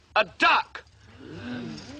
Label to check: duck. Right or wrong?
Right